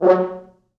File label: 4 FrHorns